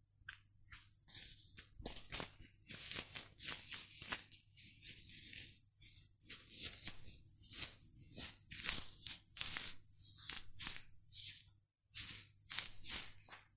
cut paper; operation

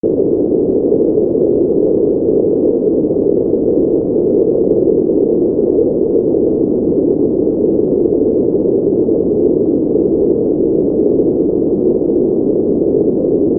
wind

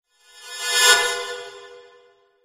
shock; animal

